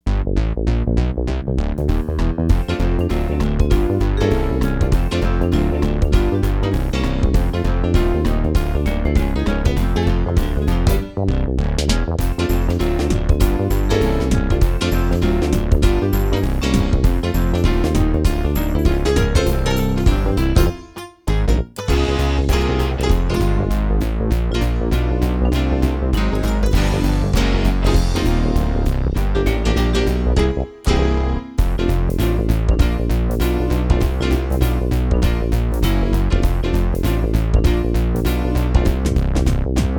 Level Complete; pac man world 20th anniversary psx

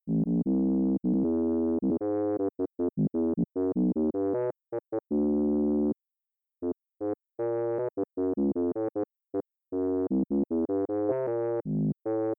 Desert; Level 1 - Desert; Taz-Mania Genesis

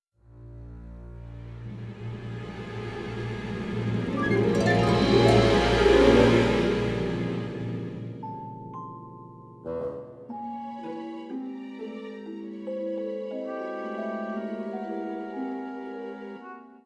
Music Future House; Costume Quest 2

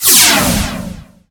Shield Disappears; Nicktoons Nitro; arcade

